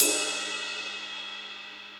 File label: Ride Edge Cop Style; Drums; Drum Hits; Standard Hits; Ableton; Session Drums Studio